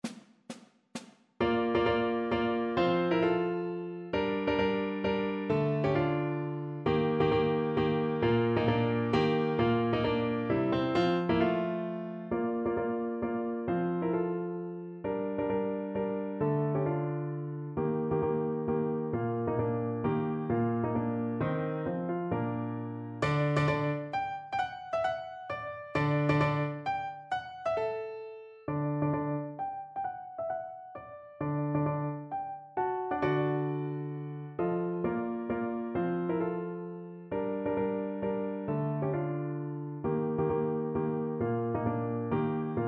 Chopin Mazurek F dur op. 68 nr 3 accompaniment for flute